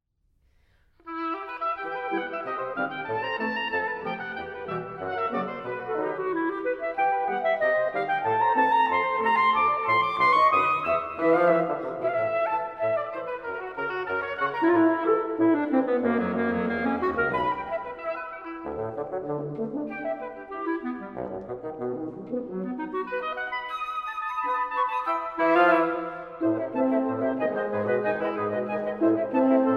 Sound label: Dance Suite for Woodwind Quintet, Gavotte